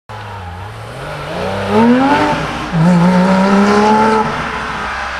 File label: sprint